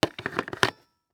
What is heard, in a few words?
Electronic Phone Hang Up Rotary; AdobeCC